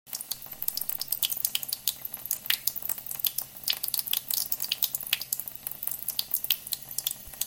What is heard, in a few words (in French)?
magneto musique